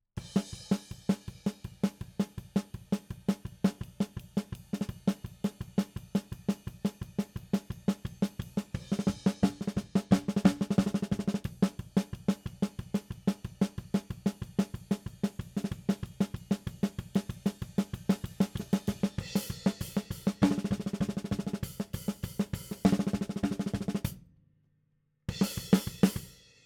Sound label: SNR top